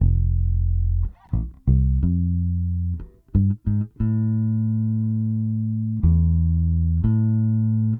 Bass, 70s Analog Funk

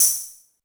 SPRAY PRC HI, TECHNO; Drums In Your FACE!

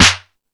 All Snares, E-MU Pro-Cussion

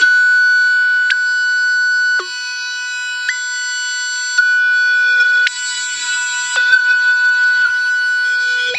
DEEP SPACE, Funk Guitar; 90 s Sample CDs; Zero G

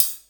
HH CL, Drum 1 Dry; East Collexion

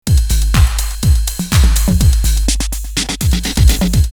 Trash Loop 124, drumloops, Rave; X-Static Goldmine; 90 s Sample CDs

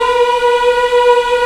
African Mm m; Roland LCDP11 Africa VOL-1

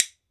WOOD BONE, Celtic Percussion; E-MU Producer Series Vol. 7 – Old World Instruments

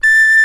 HRP RCDR&FLT

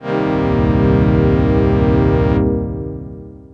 syn orch, dx7

